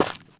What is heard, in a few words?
dirt; firefight